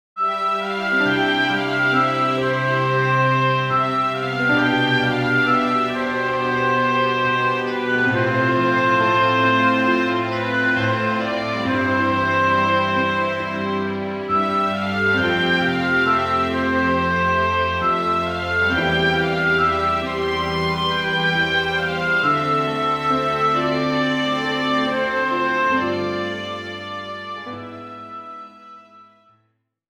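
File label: background music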